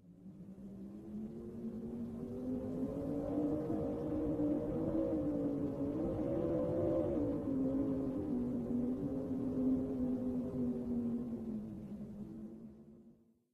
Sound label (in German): tunnelwind; minecraft